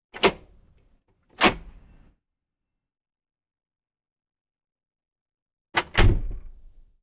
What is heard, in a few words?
Doors and drawers Alley Door; 610 Court St